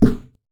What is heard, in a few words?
impact Punch medium